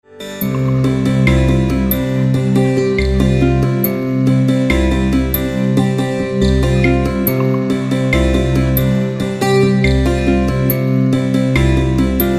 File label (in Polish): muzyka bez oplat